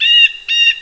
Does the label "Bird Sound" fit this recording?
Yes